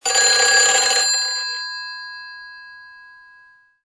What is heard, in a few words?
antique phone, ringtones